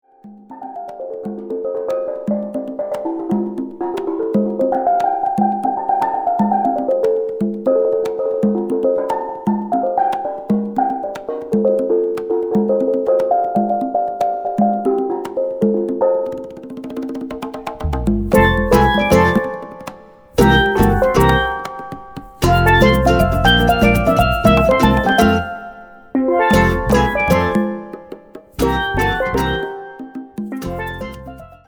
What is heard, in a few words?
conga man